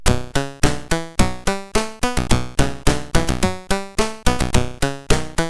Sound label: Test Modal Transpose, CSG8580R5